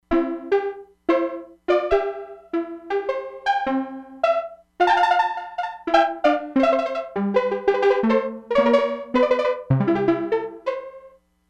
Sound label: STEEL DRUM, Crumar Bit 99; Factory Programs